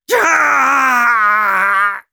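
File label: pain critical death, de; Sniper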